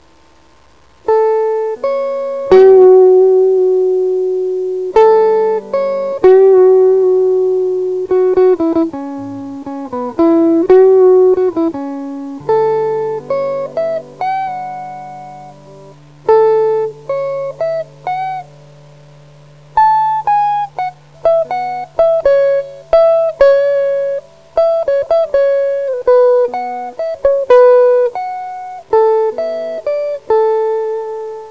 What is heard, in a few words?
wah